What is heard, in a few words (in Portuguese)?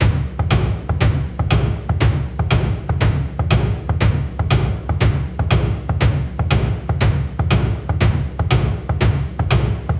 crie um loop de percusso, cave